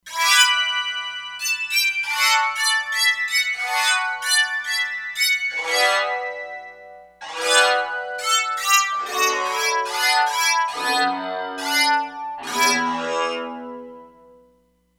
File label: Psaltery, E-mu Proteus-3; Factory patches